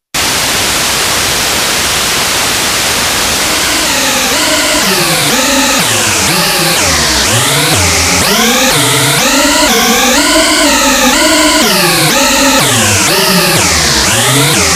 noise, multiphase; Scott Stites

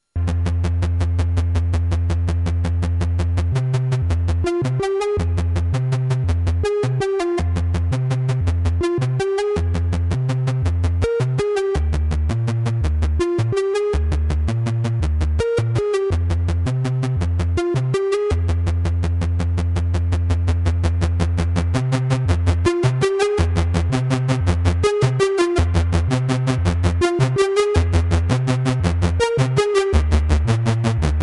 snv lfo response; Thomas Henry; SN-Voice

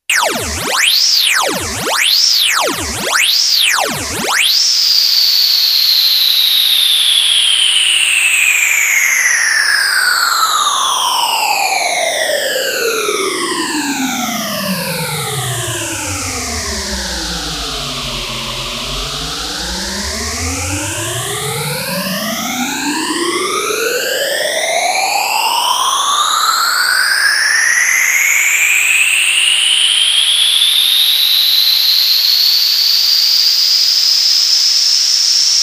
noise, multiphase; Scott Stites

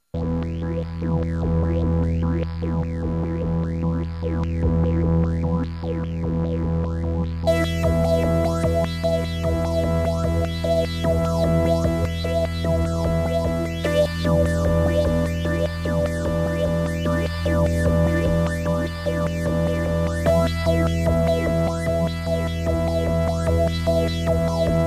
xfade, multiphase; Scott Stites

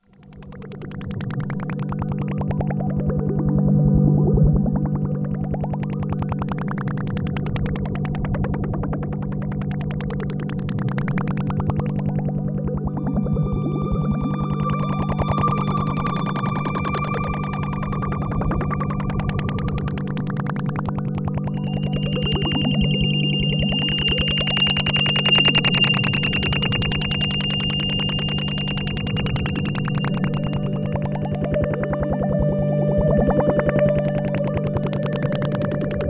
xfade, multiphase; Scott Stites

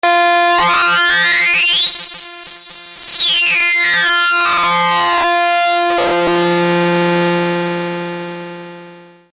sync and skew; Thomas Henry

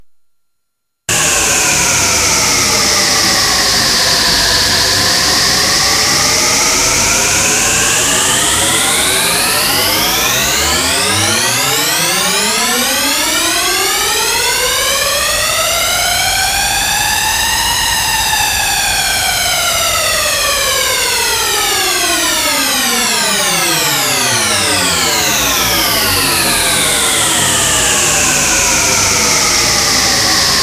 noise hypertri, multiphase; Scott Stites

